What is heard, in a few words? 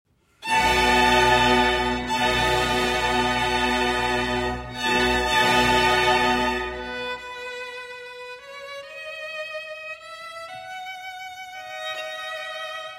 Concerto in B Minor for 4 Violins